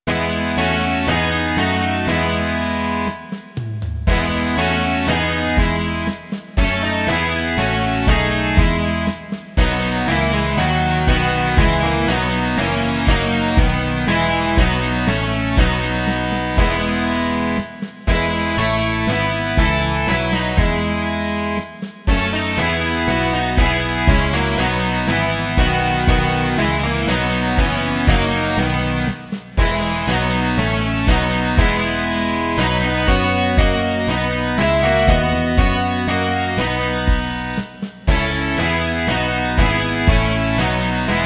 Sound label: rock